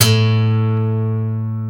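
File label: GUI Ac. A2, acoustic guitar; Best Service Gigapack 2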